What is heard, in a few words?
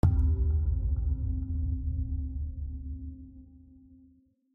Skill Learned